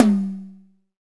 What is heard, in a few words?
TOM R, Drum 1 Dry; East Collexion